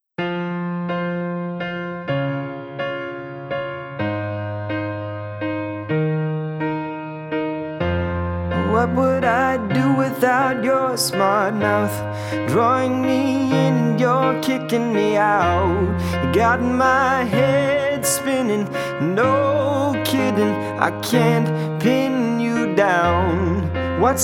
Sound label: vocal